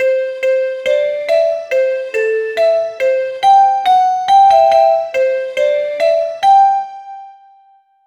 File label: positive simple melody, elevator